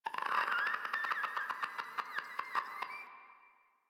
Infested Runner Patrol; Warframe; Runners

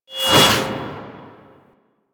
Guard Jumping Smash Pre Hit; Royal Guard; Abilities

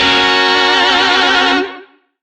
Shawzin Yo Chord I; Warframe; Lizzie Shawzin

